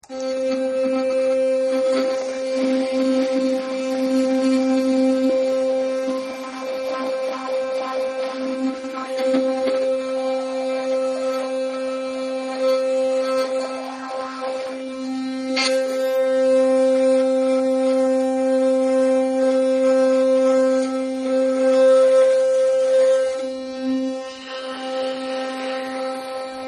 Electric Tooth Brush Use, Electric Tooth Brush Turned On And Running; For About 8 Seconds